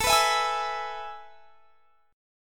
A Major 7th Suspended 2nd, chord; AM7sus2; strummed; x,24,21,21,21,24

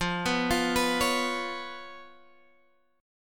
Db 7th F

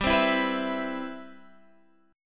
C Major 7th, G, guitar chord

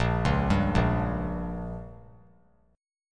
A sharp Minor 6th; arpeggiated; JGuitar

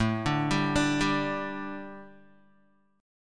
A 7th Suspended 4th A Guitar, chord; A7sus4 arpeggiated; 5,5,5,7,7,x; 4E,4A,5D,5G,5C,6F

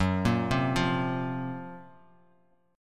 Gb Major 7th Flat 5th Bass Standard; GbM7b5 arpeggiated; JGuitar

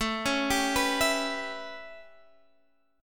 A 9th, down; A9 arpeggiated

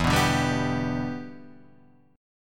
E Minor 7th, Guitar, Drop D; chord shape

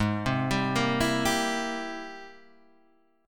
Ab Augmented Major 9th Ab Guitar Half Step Down

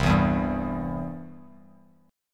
C Major, Bass Standard; strummed; JGuitar

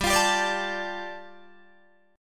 G 7th Suspended 2nd, Guitar DADGAD

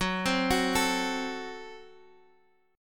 F sharp Diminished F sharp, Guitar DADGAD; 16,15,16,14,x,x

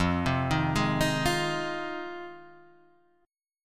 F Minor 7th F Guitar Standard; arpeggiated; JGuitar

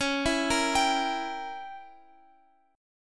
C sharp Diminished 7th C sharp, Guitar Open F; arpeggiated; C#dim7; x,x,13,11,13,14

